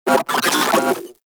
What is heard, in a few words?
Moa Vocal Enemy Spotted; Warframe